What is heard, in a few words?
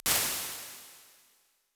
Snare Plate, Lexicon 480L Reverb; impulse responses; Big Gee's